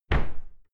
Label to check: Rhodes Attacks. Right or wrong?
Wrong